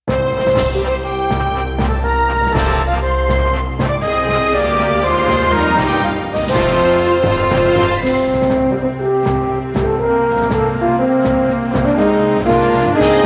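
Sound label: Yamaha PSR 530 Film Score; on-board demos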